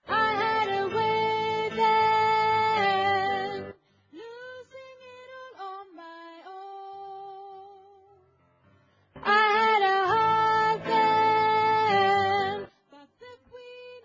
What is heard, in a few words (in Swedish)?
bas